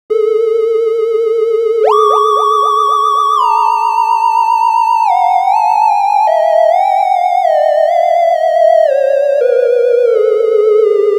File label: polybrute; analog